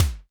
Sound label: ELEC.DRUMS; Crypton - DrumBase5000